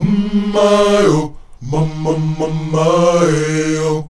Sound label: UM MAY, Afro Chants; Roland LCDP11 Africa VOL-1; Um May-O